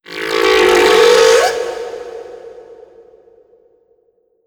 Critter Male Verb; moul-assets; Kahlo

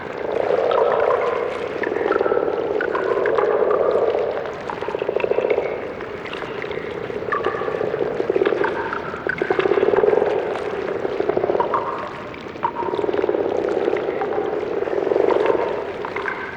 In Shroom Amb Loop; moul-assets

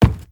Soft Wood Run

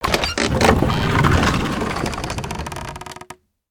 Fumerol Shutter Open

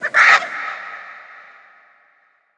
Desert Vulture

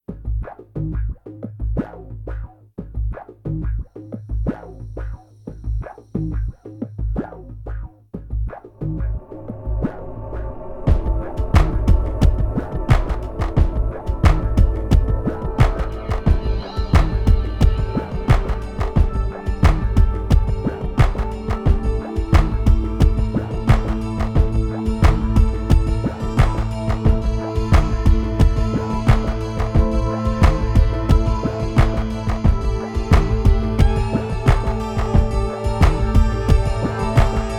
Badlands Cave Music; moul

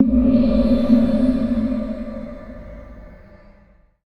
Spiral Clothes

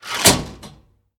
Giant Switch Down

